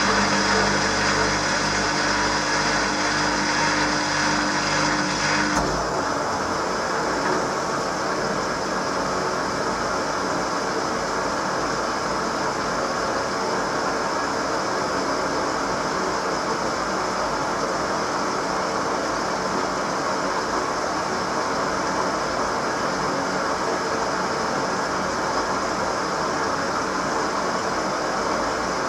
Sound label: Steady State, Washing Machine Wash Spin Cycle